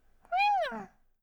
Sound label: classic miaow